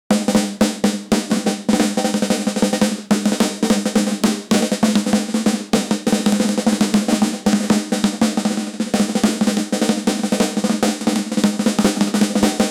a fast snare drum march; marching

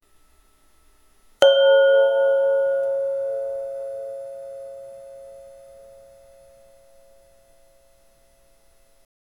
timer

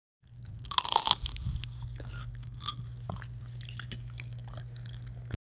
the sound of slurping jui, juice

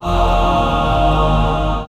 a constant angelic choir

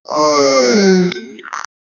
happy sound

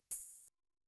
style backpack; minecraft

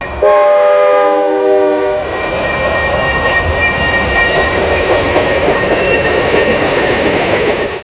train, sound-effects

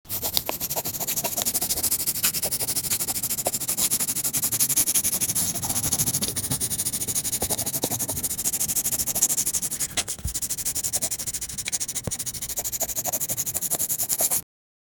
create sound like chalk w

